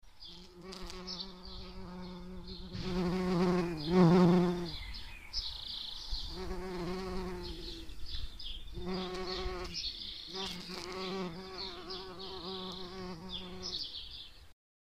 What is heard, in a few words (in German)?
Hummel